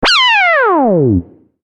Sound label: magical; maoudamashii